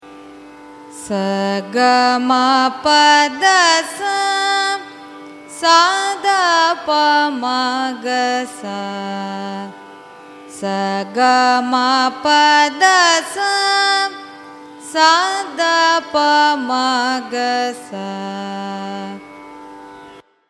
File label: raga nagaswarali arohanam avarohanam; carnatic music